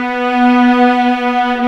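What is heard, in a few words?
viola arco B3; East West; The Ultimate String Collection; VLAS ARCO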